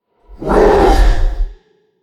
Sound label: Battle Cry